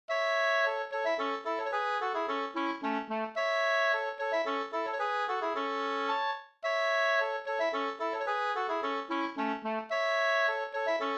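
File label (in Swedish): slangpolska, two-part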